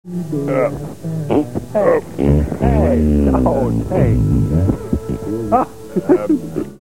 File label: fart; MST3K; Mitchell